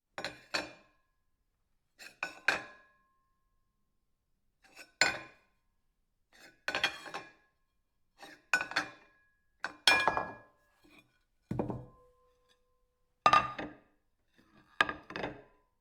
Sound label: plate ceramic